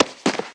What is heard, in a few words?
ledge; yo frankie